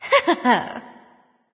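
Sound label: "heh heh", nonverbal; smite; voicepack; khepri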